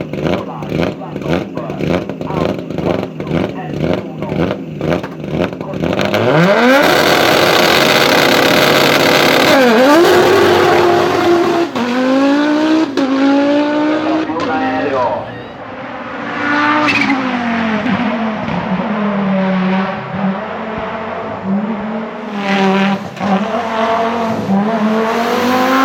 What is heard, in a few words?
770HP Audi S1 Quattro Hillclimb; Prospeed